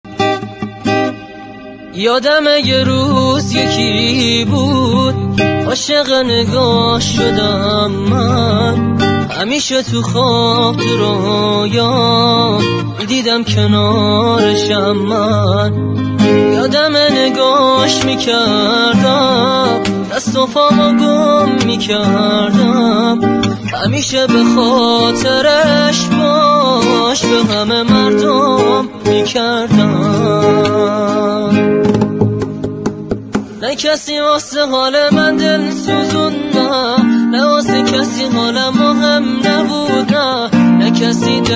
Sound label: Guitar Version